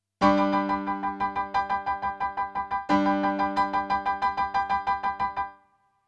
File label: PIANO, RNR for piano